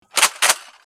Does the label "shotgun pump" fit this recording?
Yes